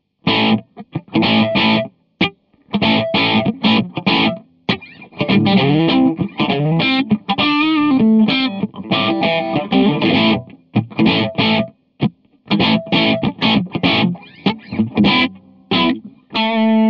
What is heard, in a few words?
guitar